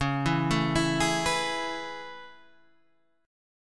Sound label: A Major 9th C sharp, chord